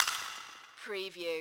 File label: GLASS BREAKING REVERB